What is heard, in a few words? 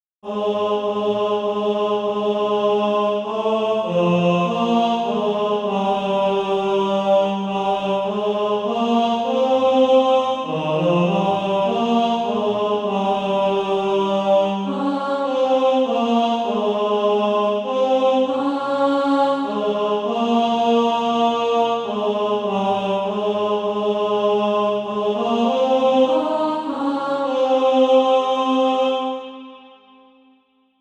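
tenor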